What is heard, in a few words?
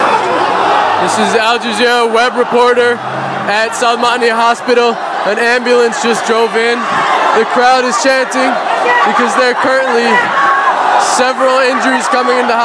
ambulance arrives; salmaniya